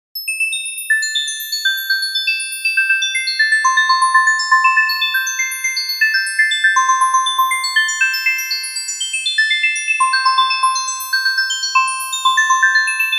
tail repeater

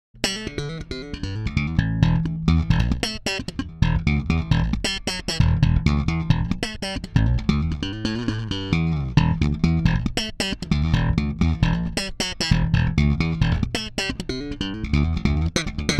Slap

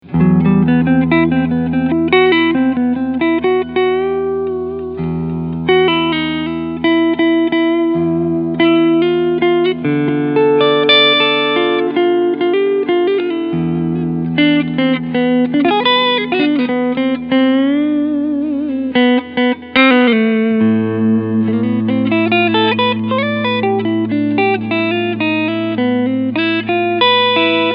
Clean sound Front EMG

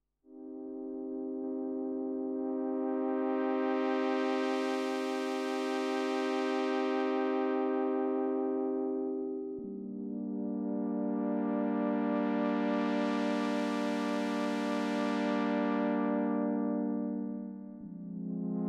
MOOG MATRIARCH Stereo Filter

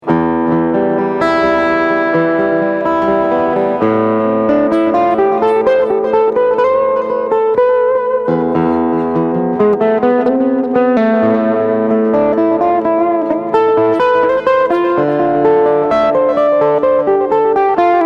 Edge of Breakup, Carbon; LAVA Music ME-4 Preset

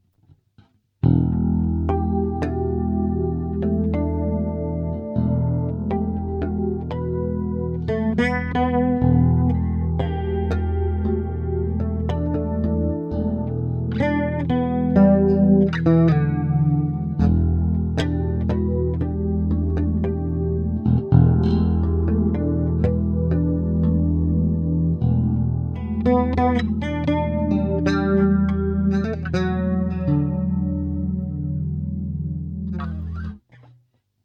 Liquifier Harmonics; Ampeg

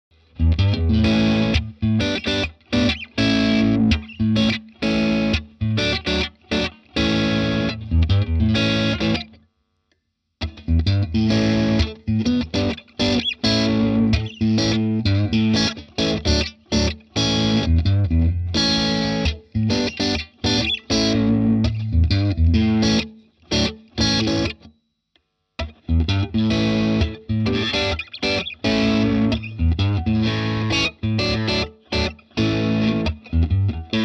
OD Strat

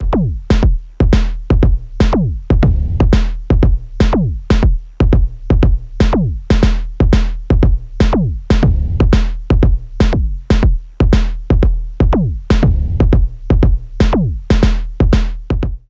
Transient Plus Sample Select Modulation; Future Retro

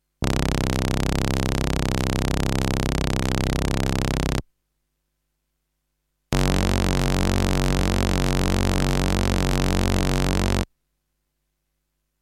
700S Multisample Recording Session

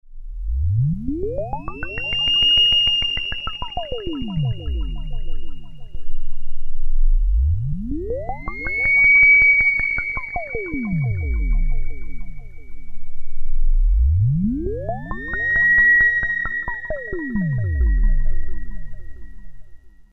arp2600 VCO mod

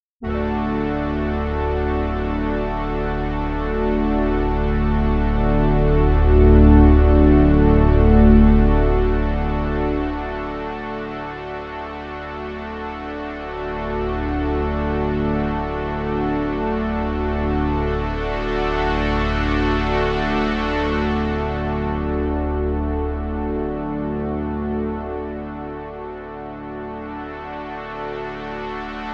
Nord Stage Pad EQ

